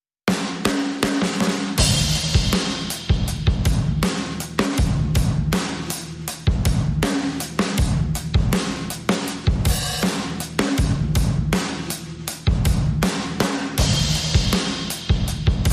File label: Perc Only